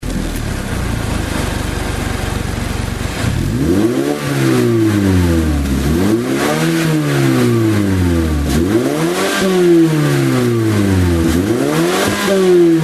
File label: Mazda CX5 SkyActiv, Muffler; AutoExe